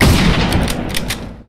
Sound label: Beretta M9; weapon